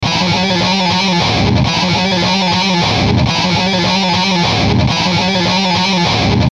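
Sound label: Musical Element, Drum Snare March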